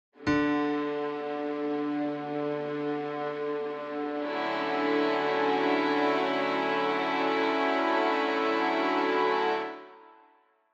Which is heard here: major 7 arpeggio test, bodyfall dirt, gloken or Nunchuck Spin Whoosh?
major 7 arpeggio test